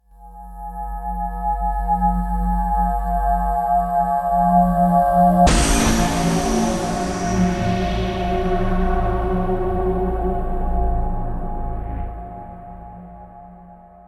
Haunted Synth Trailer Hit

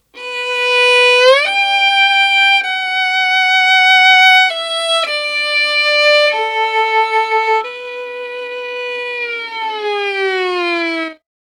Saddest Violin Glis End

